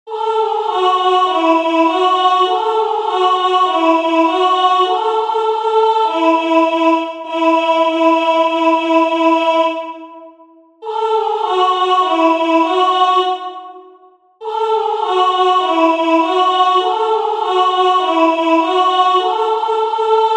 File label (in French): mon pere a fait batir maison voix